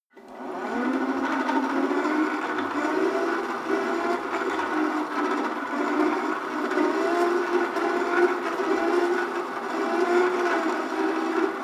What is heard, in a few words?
rope trainer pull sequence slow long, fitnessstudio